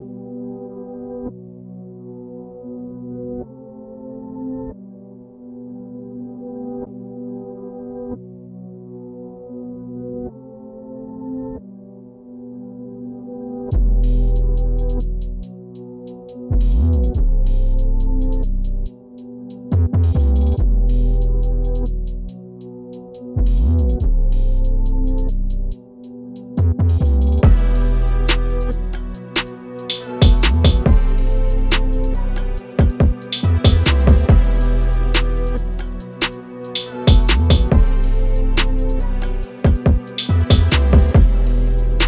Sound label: beat; Finale